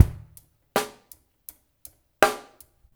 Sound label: RSS DRY DR, Drums; Spectrasonics; Liquid Grooves 1 & 2